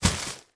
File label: plastic shopping bag full drop on carpeted floor; foley